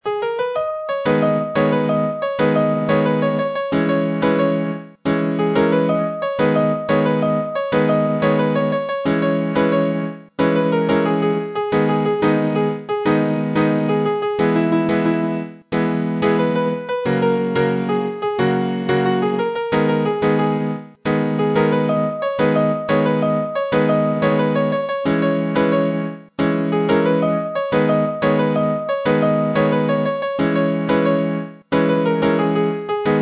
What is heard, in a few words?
Piano